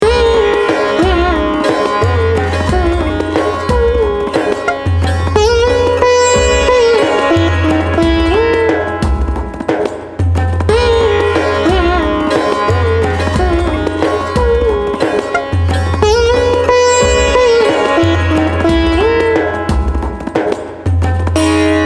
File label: sitar